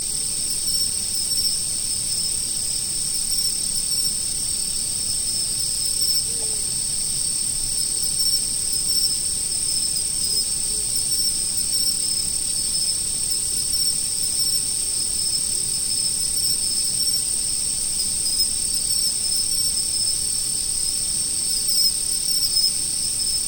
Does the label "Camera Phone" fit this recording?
No